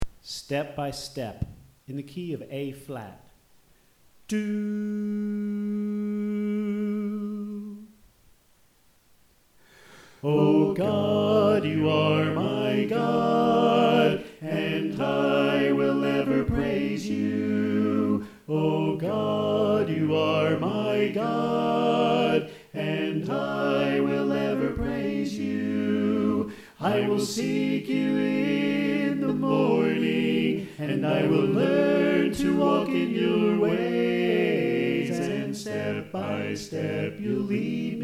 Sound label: Soprano Left